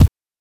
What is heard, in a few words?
BANK1 BD, NES DPCM; MIDINES